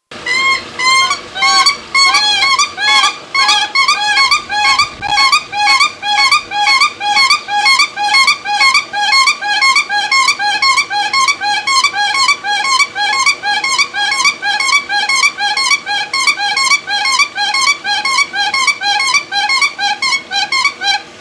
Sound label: Sibe unison, Siberian Crane; species conservation